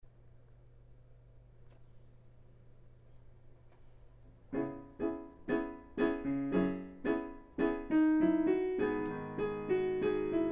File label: Last Piece No 3 WO Vocal